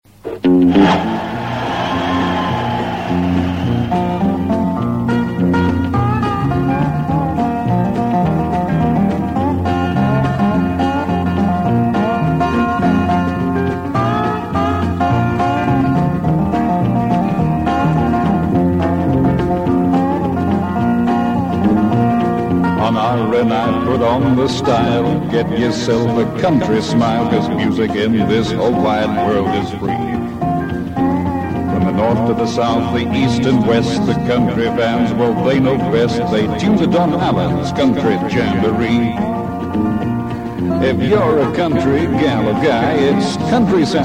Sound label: jingles